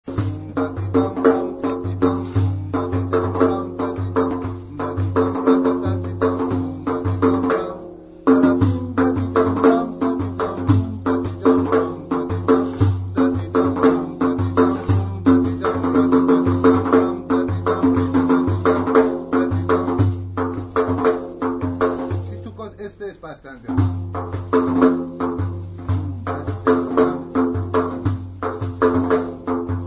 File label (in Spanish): chahar mezrab ritmo; Musica persa; nava